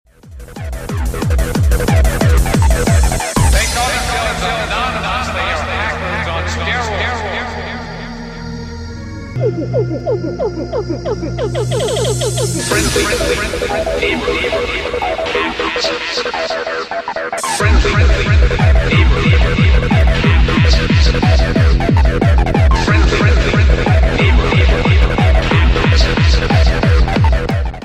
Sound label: Techno Sound; meloboom